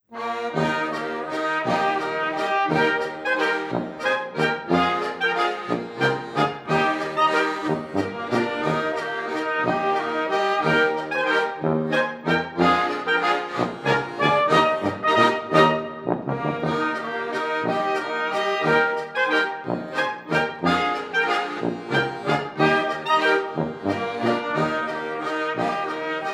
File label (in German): Weidenberger Schloßwalzer